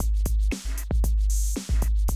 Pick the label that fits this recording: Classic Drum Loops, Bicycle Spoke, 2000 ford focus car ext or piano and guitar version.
Classic Drum Loops